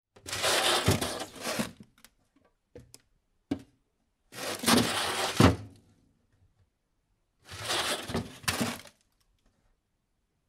morozilinik

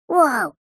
zvuk vau multyashnyi

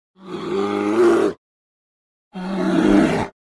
medved ruchit

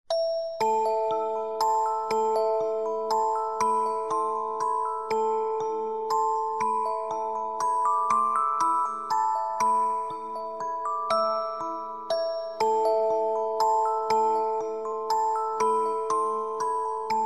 shkatulka melodiya; bethovena

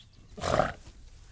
buivol

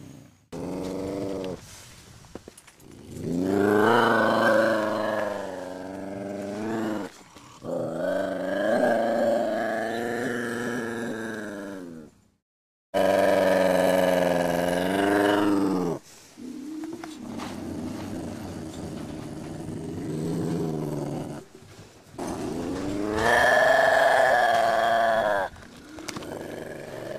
ocelot, zvuk ocelota

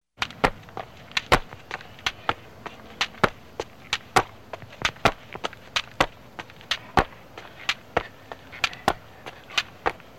skakalka

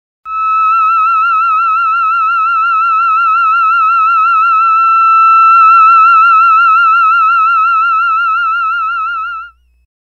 termenvoks nota mi

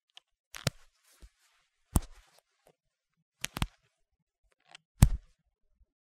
zasteg odejdy; Звуки куртки, штанов и вещей в шкафу на вешалке

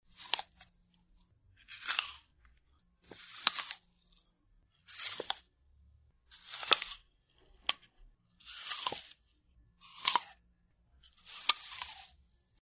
zvuk ukusa yabloka

